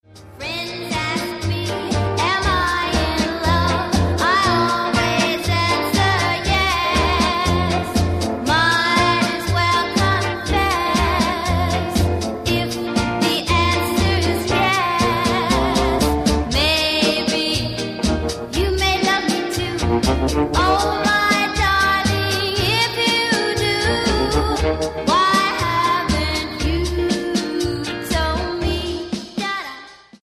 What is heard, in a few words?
music